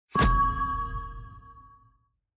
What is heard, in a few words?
bell warning; clash; lol